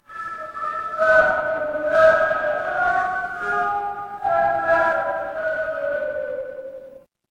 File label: crowd cheer